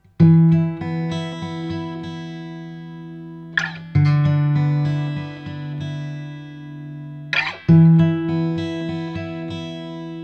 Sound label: yamaha ll16